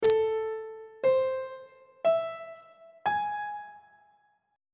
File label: a melodic minor 7th chord 1 is a minor major 7th on treble clef